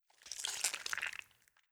Goule Moove

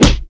Small Punch; Play Small Punch; Knight Online

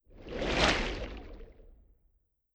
Coral Filtration Water

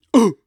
Maggot Bounce; Hollow Knight (gamerip) (2018)

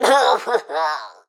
Rogue Death; Pecheneg

